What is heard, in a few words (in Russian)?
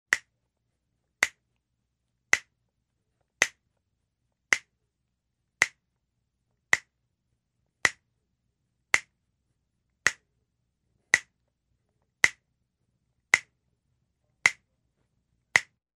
hrustjat palcy